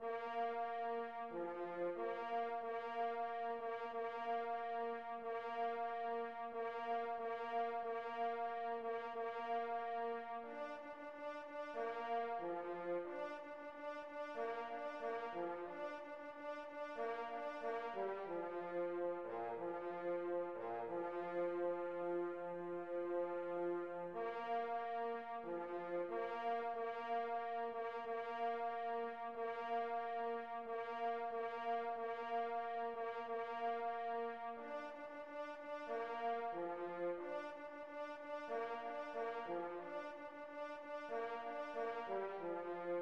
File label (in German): Ehrenfanfare